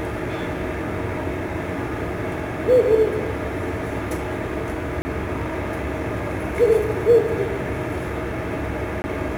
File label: owl